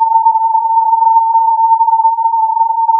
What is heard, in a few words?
filtered input